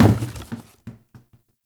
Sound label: Carboard Box Impact; Warframe; Ambience; NineteenNinetyNine